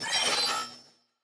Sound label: Data Calculating